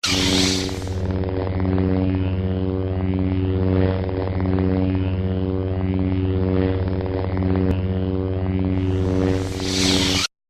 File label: lightsaber; sith